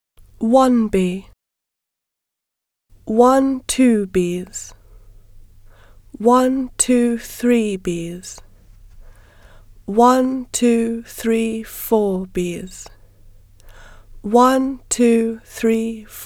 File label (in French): one to ten comptine 123456 bees